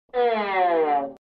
Звук снижения из мультика

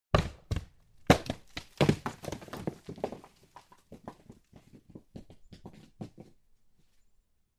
Звук большого камня падающего со скалы и катится вниз

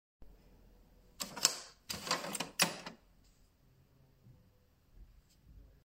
Убрал язычок принтера

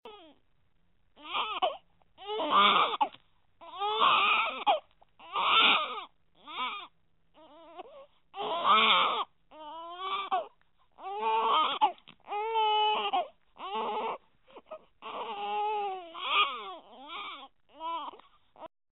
Плач новорожденого ребенка

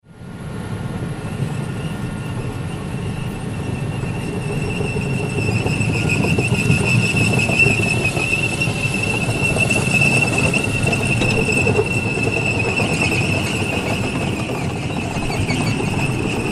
Танк проезжает мимо The tank is passing by

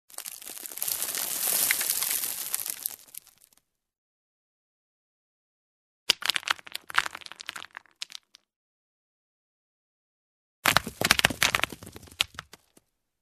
Звук мелки камни посыпались

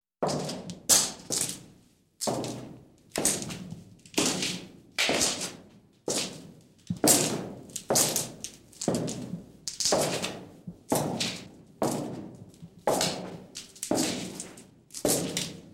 Звук шагов Шаги по корридору

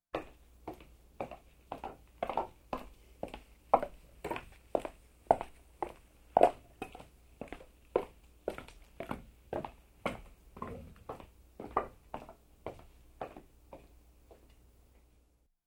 Звук шагов Шаги по деревянному покрытию

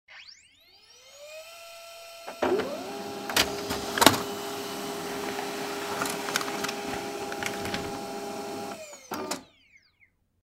Печать нового лазерного принтера

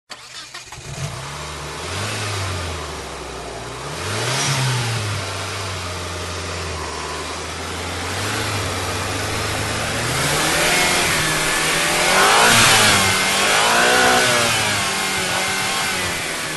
Звук завода автомобиля с помощью стартера

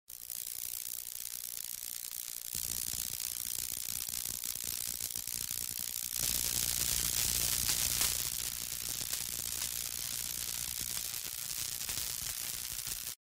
Звук жужжание светлячка

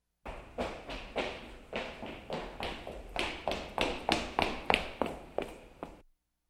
Звук шагов Бег по корридору

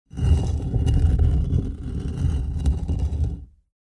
Звук когда большой камень передвигают на другое место

